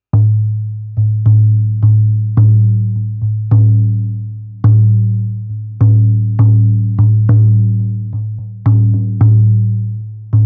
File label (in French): tambour indien